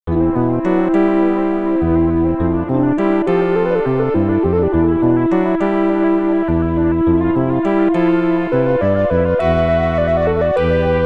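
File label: Old Man's Sigh (Stage Clear); Ganbare Goemon; Sound Treasure Box Original Soundtrack Box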